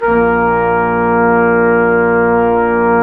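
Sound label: BRS QUINT, brass quintet; Roland L-CD702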